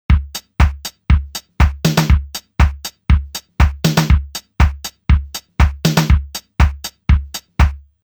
Casio RZ1 Loop

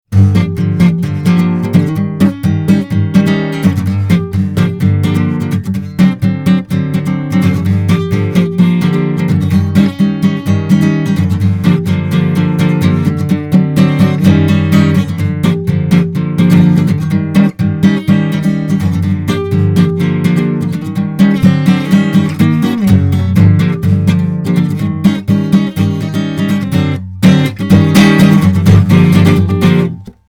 Gipsy Jazz mikrophon

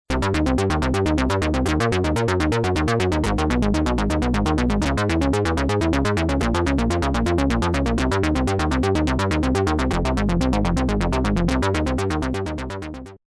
Korg Monologue Arp Lead

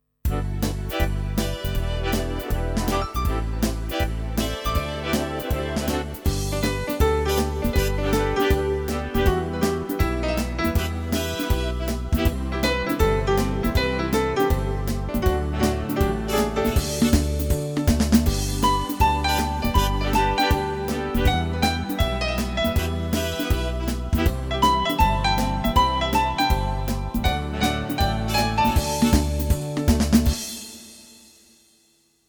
Style Foxtrot

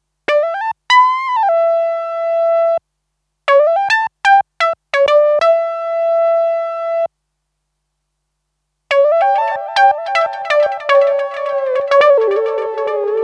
Lexicon PCM70 Single Delay Lead

